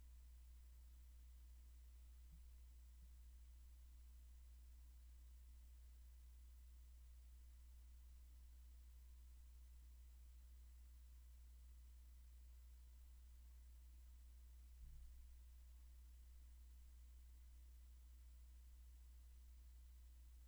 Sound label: roomtone Eye examination